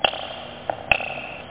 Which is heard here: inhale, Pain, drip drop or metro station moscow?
drip drop